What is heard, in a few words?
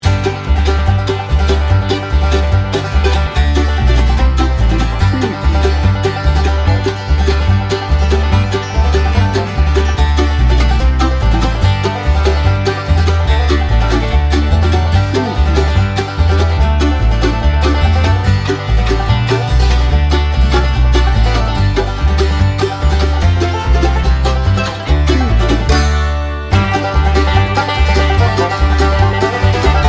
bluegrass bed